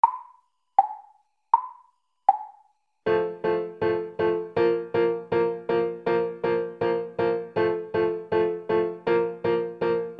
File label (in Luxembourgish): Concerto fir Trompett